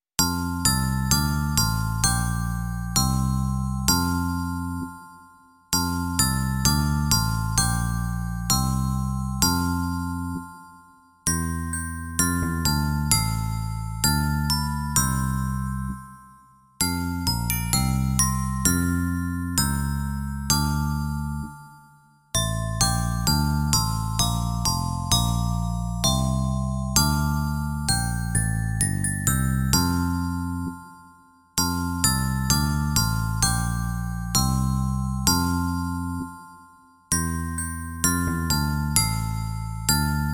zvonkohra a baskytara; Už mi oči tíží sen; Imaginativní verze

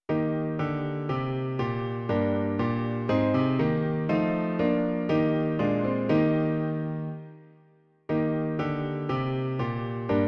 klavír; Slyš, kdo v tiché noční chvíli; Klavírní verze

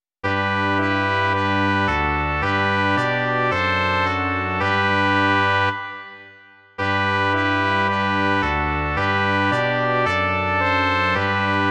žesťový kvartet; Umdléváš-li; Imaginativní verze

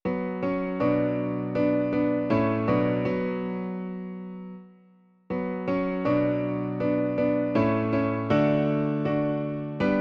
klavír, Klavírní verze

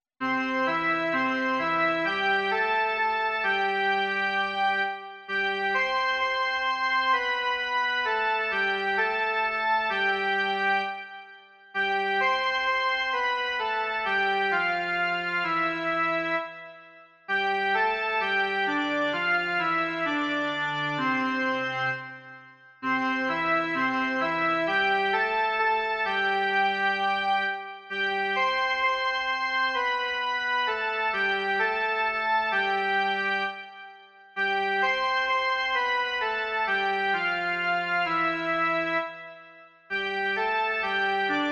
jen melodie; Velký Bože, Stvořiteli

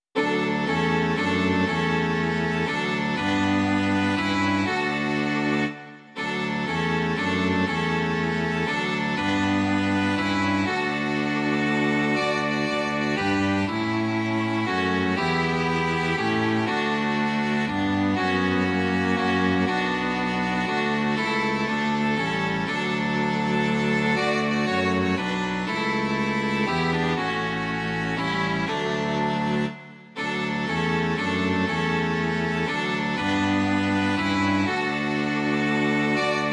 smyčcový kvartet; Imaginativní verze

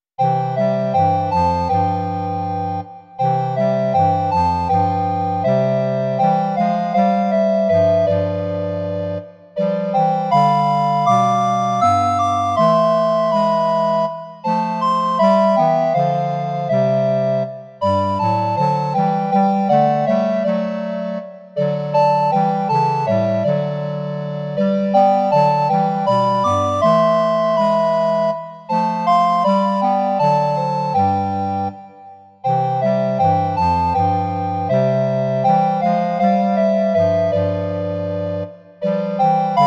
2 zobcové flétny, klarinet a basklarinet; Vizme pacholíčka; Imaginativní verze

